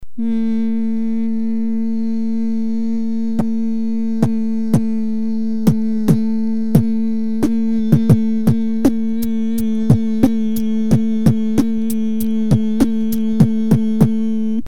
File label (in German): summen